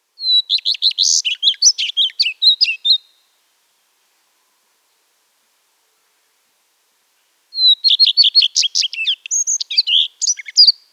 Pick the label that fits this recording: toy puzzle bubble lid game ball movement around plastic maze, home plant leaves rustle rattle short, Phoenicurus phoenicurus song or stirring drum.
Phoenicurus phoenicurus song